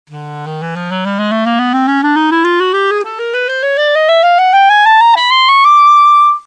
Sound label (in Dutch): klarinet range, blaasintrumenten